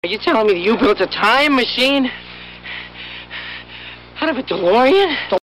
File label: Time Machine; Bttf